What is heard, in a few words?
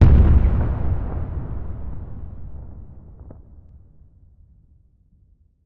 explosion large distant; sound effects one